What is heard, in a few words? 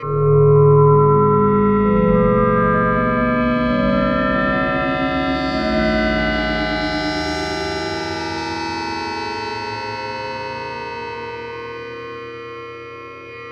Soundscape; FX